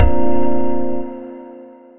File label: bonus Sym; netent